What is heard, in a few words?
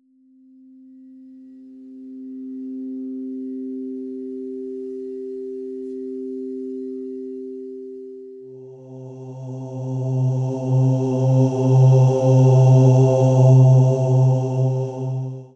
root Chakra C